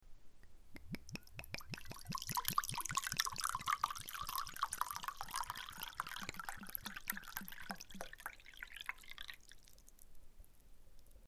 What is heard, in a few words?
wine